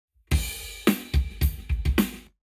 Lab Wet Drums 0deg; eMagLS

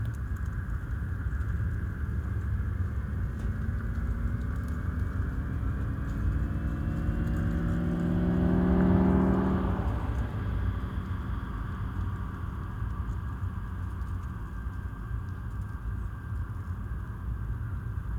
Cardioid AVAS Directivity, electric vehicle

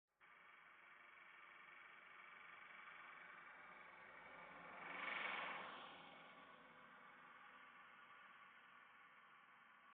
indoor Combustion, electricvehicles

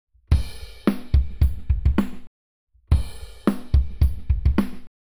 Simulation vertical Drums 0to360deg; SMA EM32

